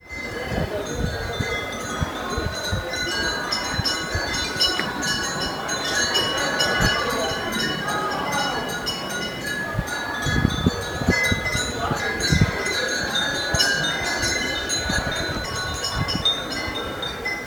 Temple Bells

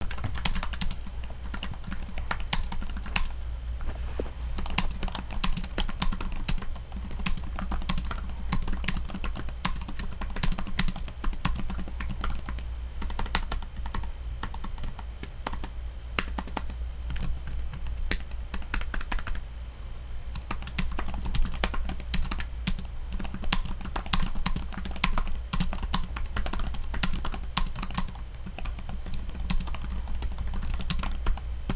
Typing

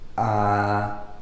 Aaaaa

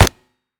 M4 Fire Dry

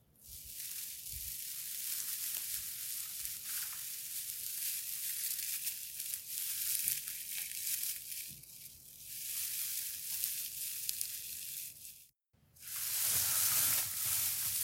Plants Or Straw Hay Dried Cereals

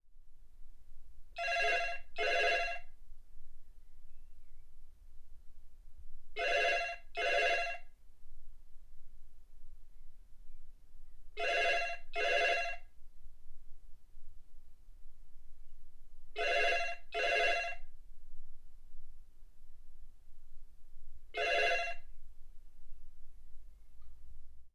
Six Analog Telephones Ringing

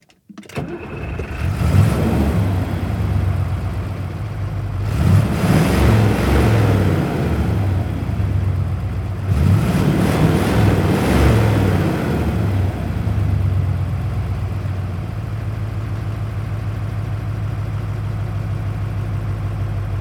Diesel V8 Engine Start

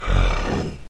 Growl

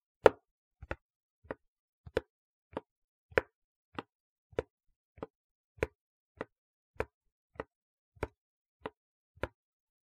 Footsteps

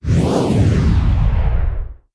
Turning Back Air Whoosh

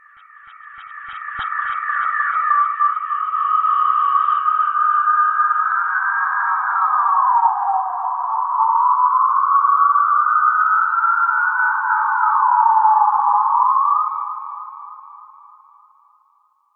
Whistle Processed Reversed

